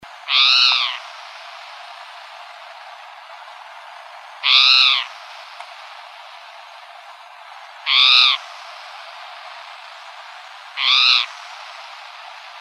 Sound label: CALLS, Emberizids; AZFO; deviche